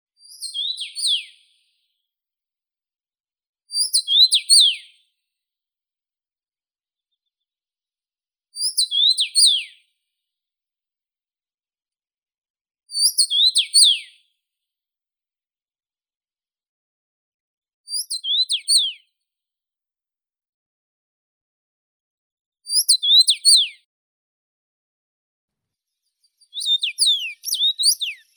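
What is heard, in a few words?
common rosefinch, Carpodacus erythrinus; chechevitsa obiknovennaya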